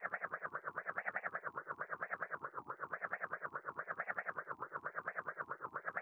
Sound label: Hatcher Fly Loop